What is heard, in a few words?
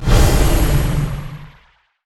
mystic flare cast; weapons; dota; skywrath